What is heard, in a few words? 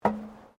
FOOTSTEPS WOMENS LOW HEELS ESCALATOR STEP; Footstep Foley; Footsteps (Sound Effects:Human)